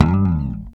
REAL BASS, BASSLICKS; Ueberschall; Jam Box

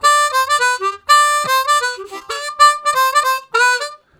Harmonica, WAV Riffs; Zero G

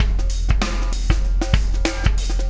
Wormhole Beat, Processed Drumkits

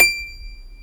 PIANO F#5; WS#6 JAZZ

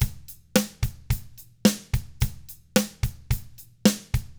POP ROCK Drums; 109POP ROCK

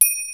JD-800 KIT

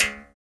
STR BS, Berimbau; Roland LCDP11 Africa VOL-1

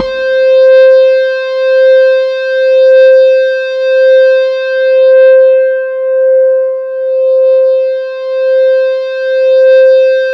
C5, SynthLine; 90 s Sample CDs; NiceBeats; SynthLine VOL-33

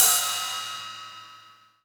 Rides, Cymbals

